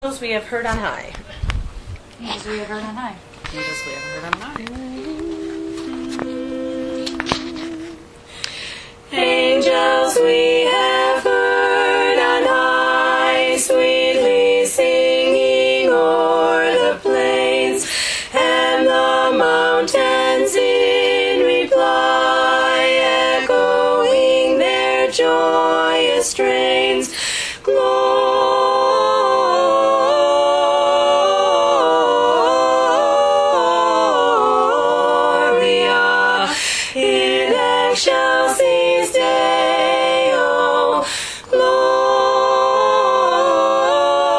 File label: Angels We Have Heard On High, Tenor